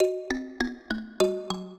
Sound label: minuet, mbira; Mozart